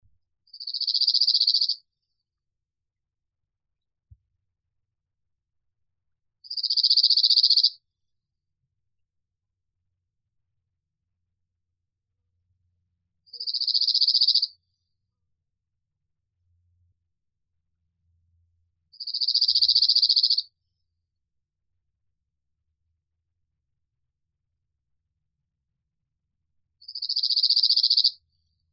ovsyanka ogorodnaya emberiza cirlus

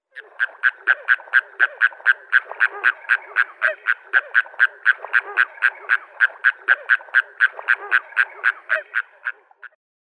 zvuki prirodi tsaplya belaya srednyaya egretta intermedia

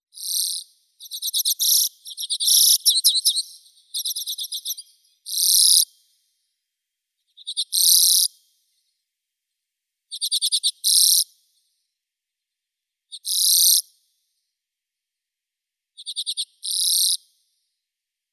chechetka obiknovennaya acanthis flammea

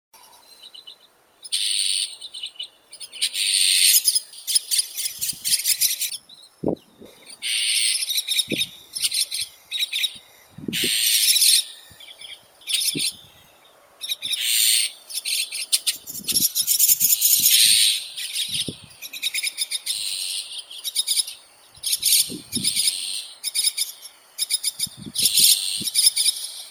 zvuki prirodi skvorets yaponskii sturnia philippensis

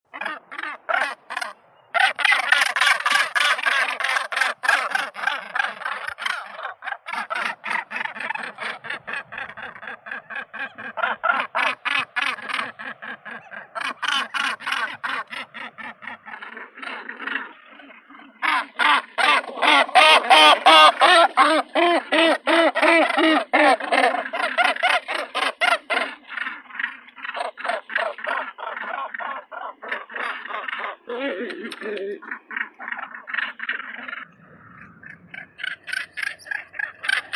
olusha severnaya morus bassanus

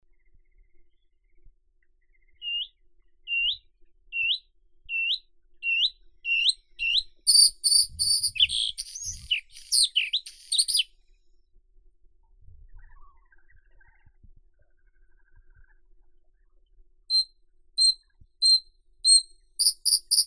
varakushka luscinia svecica, zvuki prirodi

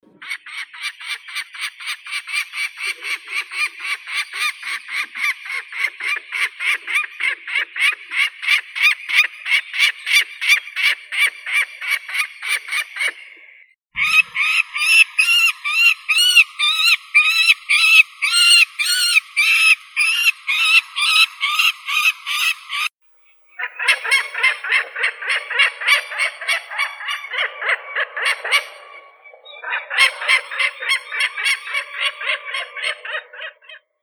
sapsan falco peregrinus; zvuki prirodi

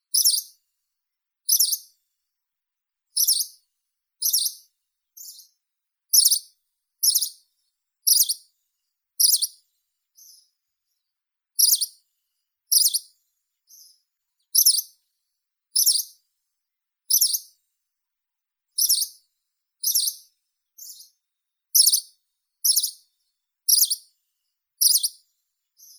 penochka zheltobruhaya phylloscopus nitidus

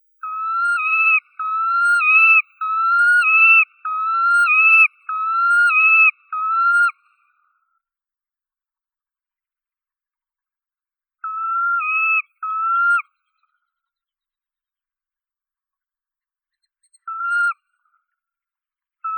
pesochnik islandskii calidris canutus

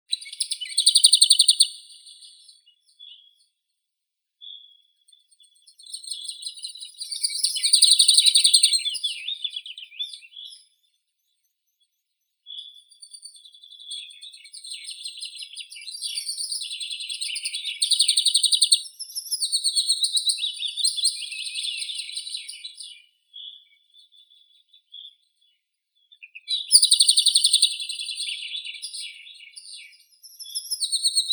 slavka melnichek sylvia curruca, zvuki prirodi

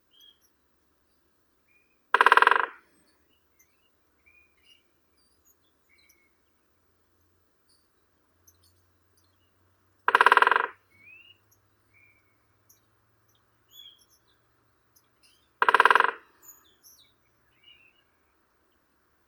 dyatel bolshoi pestrii dendrocopos major

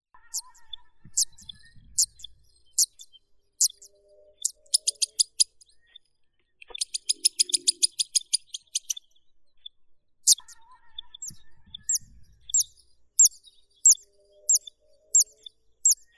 zvuki prirodi tsistikola veerohvostaya cisticola juncidis

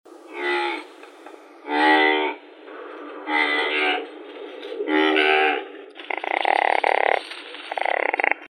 saiga saiga tatarica samka, zvuki prirodi

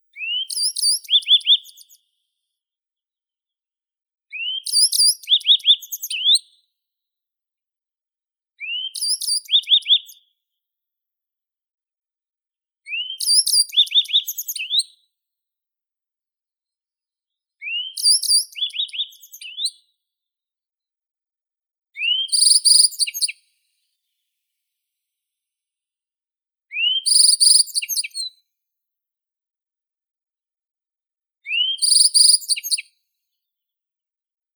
ovsyanka zheltobrovaya emberiza chrysophrys; zvuki prirodi

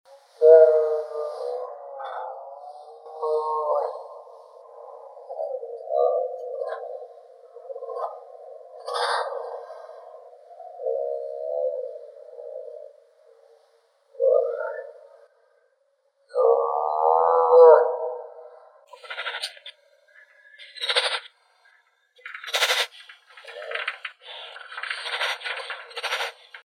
zvuki prirodi tigr amurskii panthera tigris altaica

